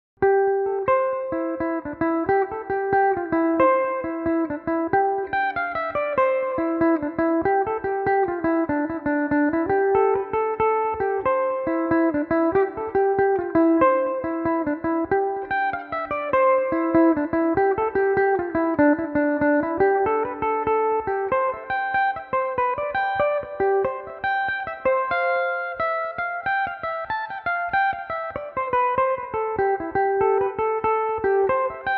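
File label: C major, irish mandolin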